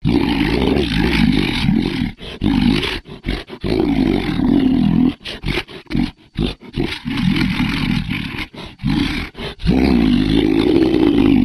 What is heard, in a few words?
Monster Angry